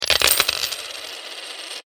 money coins drop, Coins Drop Onto Table; Coins ( Approx 10 ) Drop Onto Wooden Table; foley; Drops; Objects; McKinney Sound

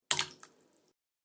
Water Splash; Splash Sound Effects; Splish, Splash, Splosh!

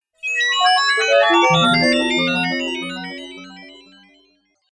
Magical Transformation; Magic Sound Effects

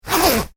zipper; foley

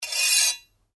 Blade Scrape Medium, Sword; Foley sounds for melee weapons; Swords (Sound Effects:Combat)

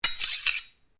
Blade Swish Low, Sword

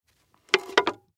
Tv Tray Wood, Tv Tray Wood Pick Up Carpet; Living Room; Household; Blastwave

